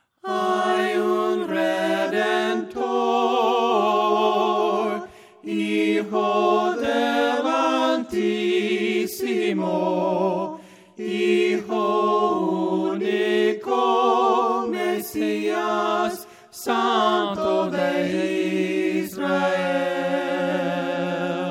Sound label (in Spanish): voces; cantos del camino